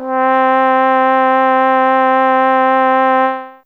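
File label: Slow Trumpet 1 C4, Fairlight CMI IIe Brass; Brass2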